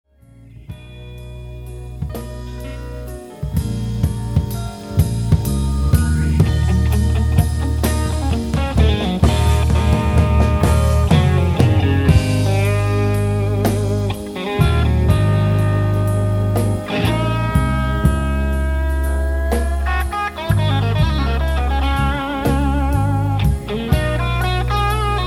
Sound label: blues guitar solo; Multitrack done using Nuendo